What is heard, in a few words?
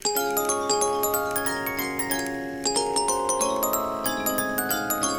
Boxy Jingle